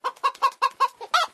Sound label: chicken